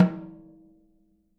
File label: SN OFF, snares